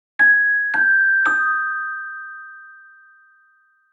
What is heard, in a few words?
Marimba